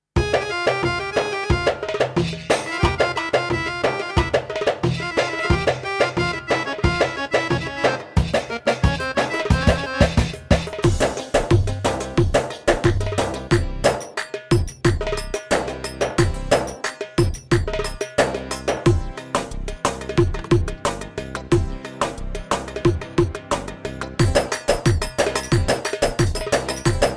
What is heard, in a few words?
Fallahi Mix